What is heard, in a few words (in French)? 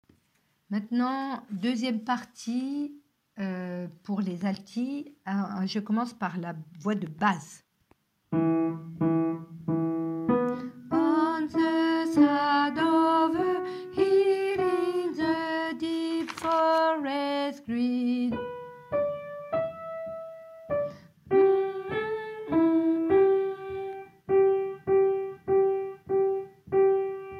alti partie